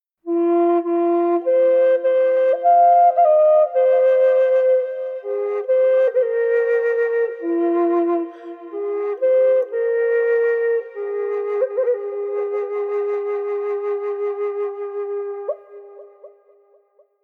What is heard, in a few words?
bamboo